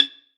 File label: perc organic tink